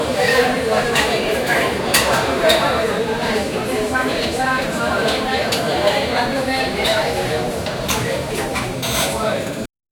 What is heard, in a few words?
busy coffee shop filled with chatter of patrons and workers